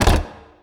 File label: Puzzle Error